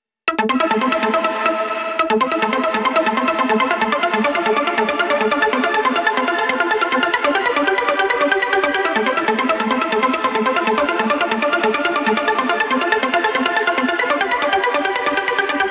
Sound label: Pitched Arpp, 140 BPM; Vengeance Samples; Vocal-Hook Kits; Song Kit; Mr Big Boom